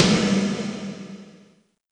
Snare, Alesis D4fx

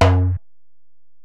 Tom, Alesis DM-PRO; Toms

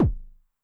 BD MOD [Pitch+Depth], 909mod, BASS DRUM

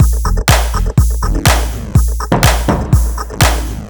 D# 123bpm, House Drumloops; Zero-G House Fabrik Drumloops

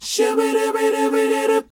SHOO B B, R&B Dance, vocal; Spectrasonics Vocal Planet

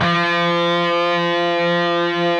PRS F#, Guitars, LEAD; Spectrasonics; Hans Zimmer Guitars VOL-1

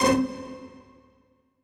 PIZZ, Dance Mega Synth

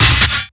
Kick Snare; Esoterik Beatz; Saver Kit

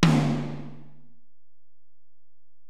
Tom, Alesis DM-PRO, Drum Machines, Toms

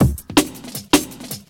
Beat, Futurist Drum & Bass; Drums & Percussion

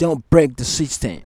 VOX, Singin

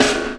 Snare, Hard Stepz, drum loop hits; Zero-G Jungle Warfare 1, 2 & 3; Percussion Hits

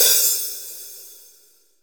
HH MED, DRY KIT, Real Mega Drums

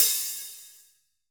HH half opened; Best Service; Festa Latina VOL-1; Single-Hits

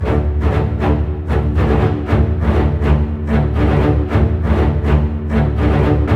120 READY, Scoring Tools ORC Ready Fight

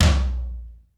ROOM T, ROOM KITS; Crypton - DrumBase5000

